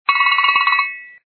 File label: cowbell; korean miner